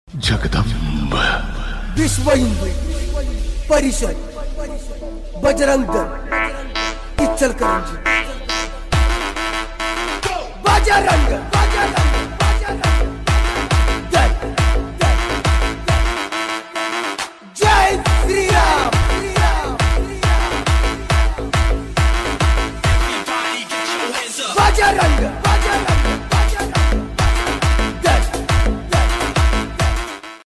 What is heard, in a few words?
bgm remix; bajarang